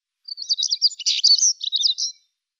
Sibirisches Schwarzkehlchen Saxicola maura; Vogelstimmen

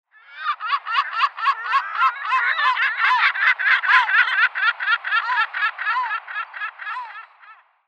Heringsmoewe Larus fuscus; Vogelstimmen

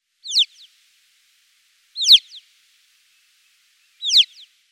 Alpendohle Pyrrhocorax graculus; Vogelstimmen

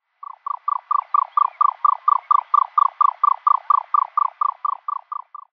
Pharaonenziegenmelker Caprimulgus aegyptius

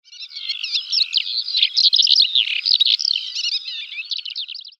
Mohrenlerche Melanocorypha yeltoniensis, Vogelstimmen

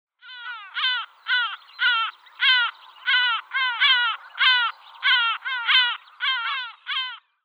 Mittelmeermoewe Larus michahellis

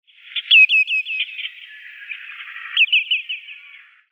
Kleiner Gelbschenkel Tringa flavipes; Vogelstimmen

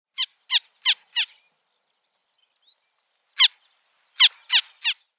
Wiesenweihe Circus pygargus; Vogelstimmen

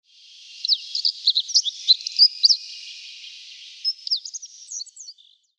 Braunwuerger Lanius cristatus; Vogelstimmen

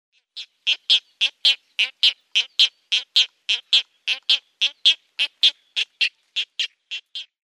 Silberreiher Casmerodius albus; Vogelstimmen

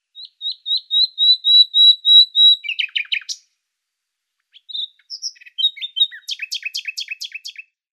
Nachtigall Luscinia megarhynchos

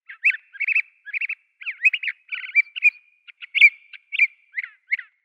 Rote Spottdrossel Toxostoma rufum

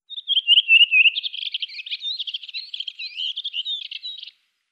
Rotdrossel Turdus iliacus

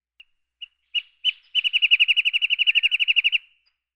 Chaparraltimalie Chamaea fasciata; Vogelstimmen

